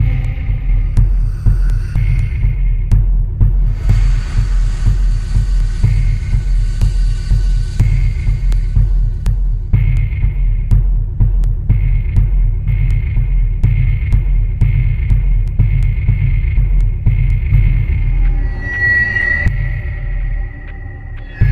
chase theme; slender; smokey; scp cb; 106

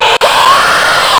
normal alert; floaters; slender

